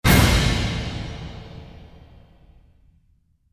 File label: Blood Orch; Gothic Storm Music